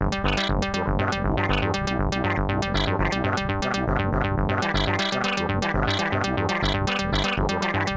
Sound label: Clockwork Machines, Cartoon; LSD Dream Emulator; ps1 gamerip 1998